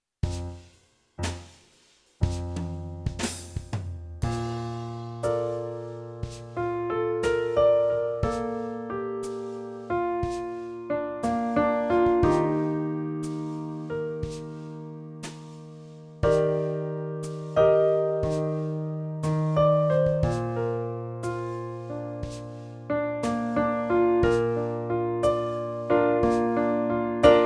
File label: Long note warm up in Bb; Level B